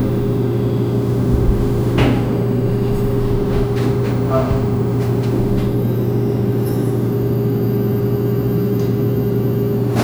room tone hospital matern; calm

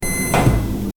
a nice soft beeping sound, appliances

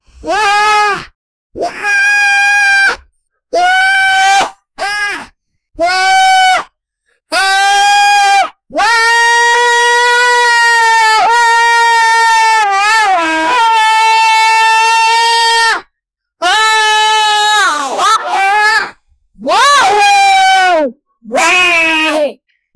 demonic voice sining scre

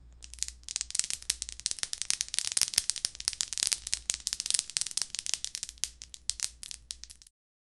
firing a flare; signal

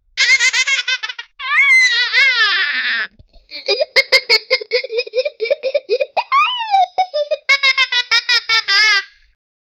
child lafing

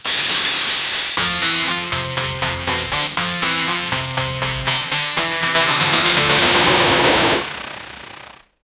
a horse gallop but made

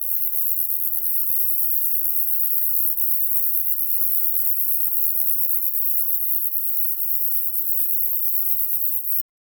insect wings

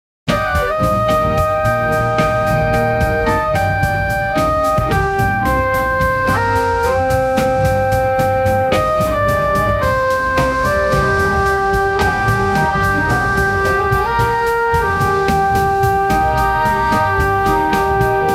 vhs music documentary

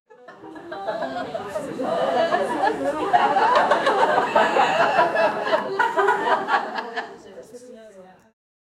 medieval tavern ambience

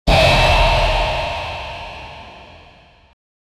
there is a danger soft, danger

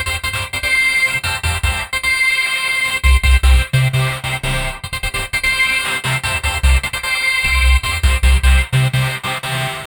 cyberpunk neon synthwave, ringtone

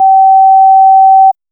soft tone that quickly be; notifications

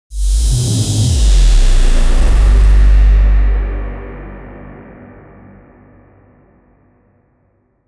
cinematic fx downer sound

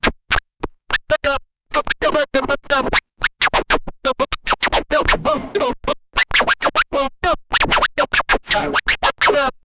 vinyl scratch mix with hi; sound effect

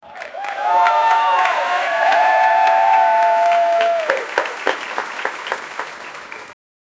the applause of the crowd; audience

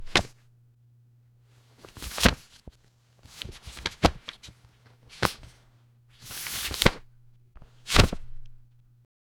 large open hardcover book; paper

